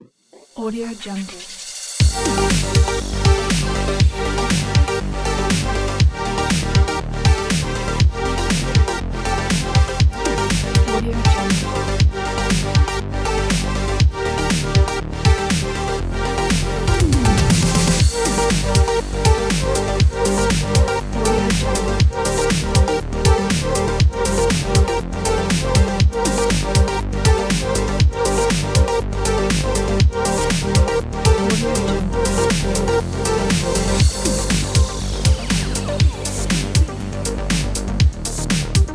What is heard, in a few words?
energy synthwave